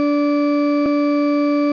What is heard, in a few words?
ship shield